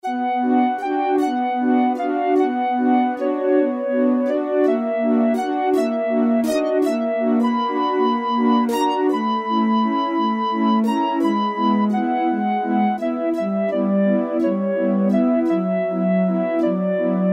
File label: STRING FLUTE, Casio VZ-10M; Internal factory combi